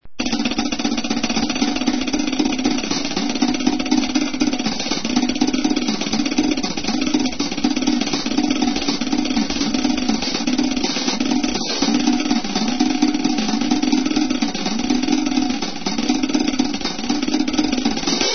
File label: DRUM; Marcha